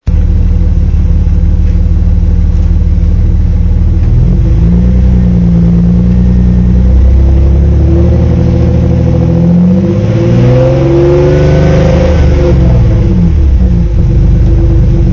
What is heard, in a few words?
SFZ V6, CITROEN